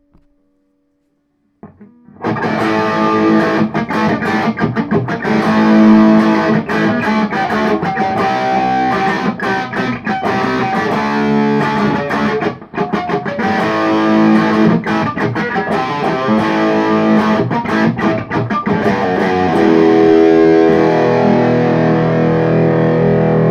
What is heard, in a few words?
LP92 OD 498T bridge, gibson les paul; 490R (neck) et 498T (bridge)